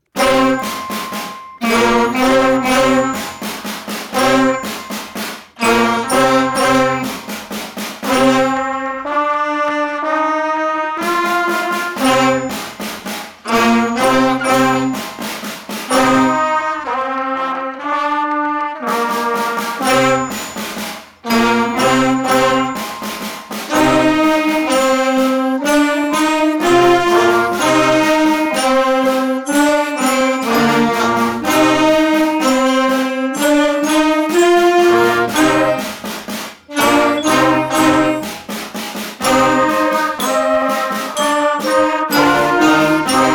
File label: beginner band remote concert